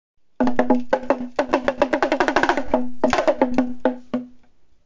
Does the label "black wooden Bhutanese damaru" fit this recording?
Yes